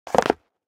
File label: concrete land; Golden Crayon Sounds